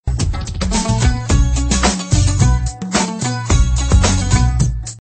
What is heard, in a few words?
Gitar Tune